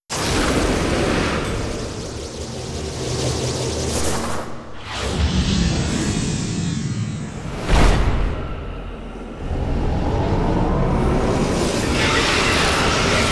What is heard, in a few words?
Ending (SFX)